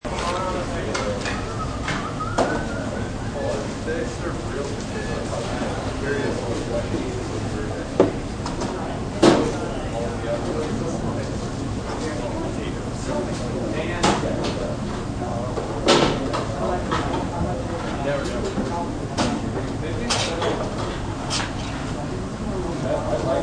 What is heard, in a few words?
Fast Food Joint, Fastfood place ambience.; Restaurant Sound Effects; Sound Effects:Backgrounds